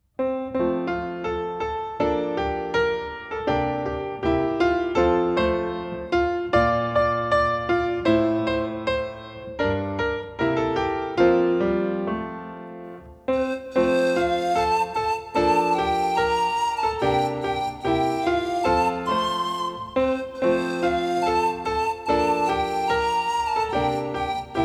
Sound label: hum your favorite hymn music only; childrens songbook